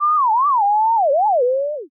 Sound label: rewind time